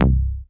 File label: BASS MONO